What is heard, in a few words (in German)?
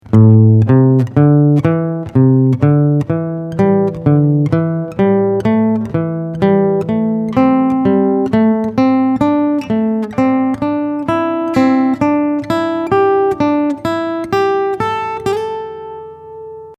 4er Sequenz